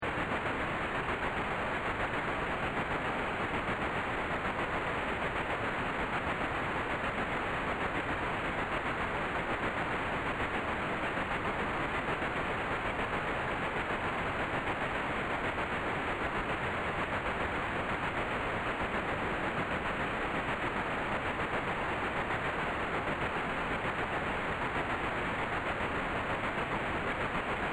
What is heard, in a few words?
Train Carriage Ambience; Loop Friendly